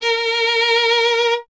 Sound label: STR VLN, Vln Detache; Miroslav Vitous